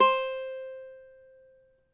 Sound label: CAVAQUINHO C, Guitars; Spectrasonics; Hans Zimmer Guitars VOL-2